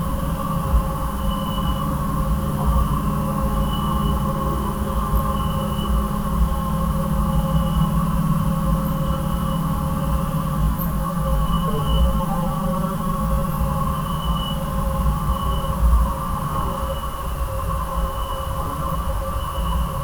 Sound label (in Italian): passi